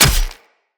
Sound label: Short Sword Medium Impact Flesh